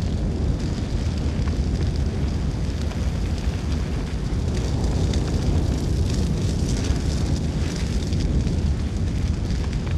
fire loop big flame; colony; Titanfall 2

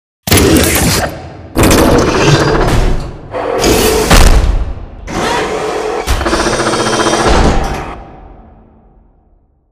Assembly Wall Arm Close; Boomtown; Titanfall 2

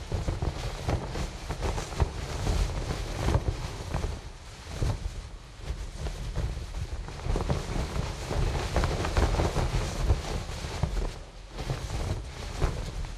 tarp mvmt canvas close; Titanfall 2

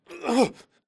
spectre zombie drag grunt; Titanfall 2

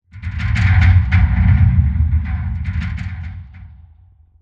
dish crane move start metal stress; Titanfall 2

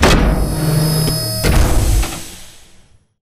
dropship door open; Titanfall 2

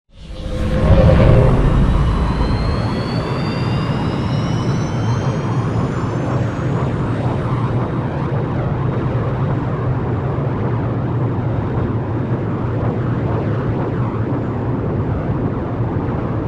small ship takeoff; Titanfall 2

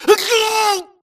grunt electro; Titanfall 2

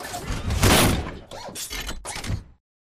bt melee high elbow forward; Titanfall 2

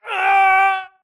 grunt death crush; Titanfall 2

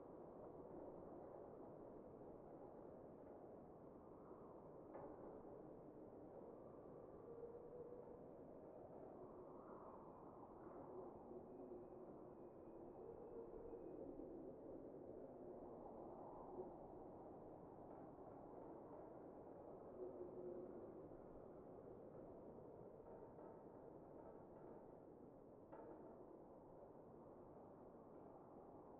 closed room; Titanfall 2

